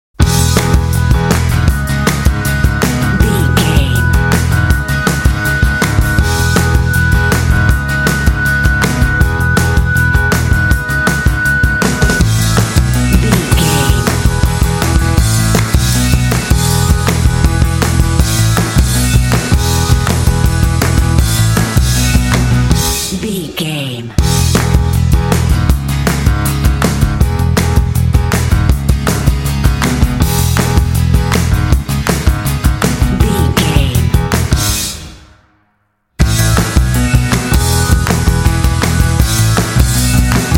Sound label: pop upbeat happy fun bed